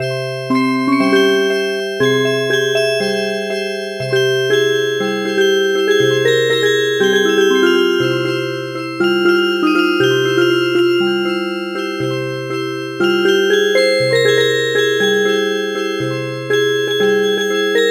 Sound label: Music Box